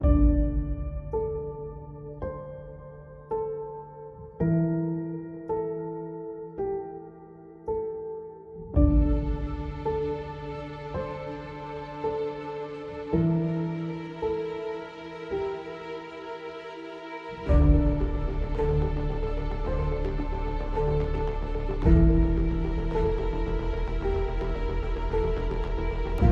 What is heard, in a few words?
No Drums and SFX